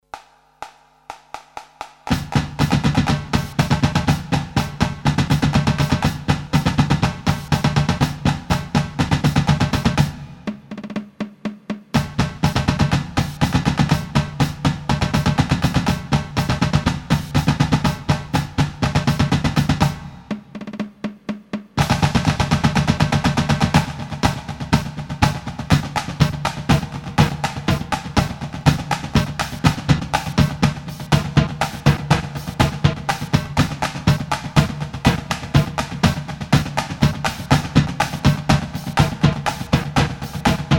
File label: Paradiddle